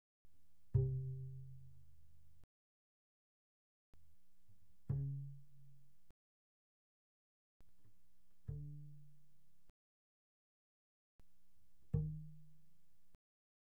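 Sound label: Bass pizzicato pianissimo sul A C3 A3, doublebass; Strings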